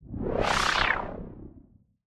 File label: Anime Air Movement